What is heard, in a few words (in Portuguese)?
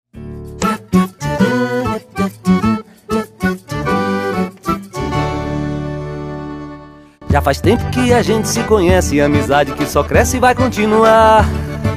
Jingle animado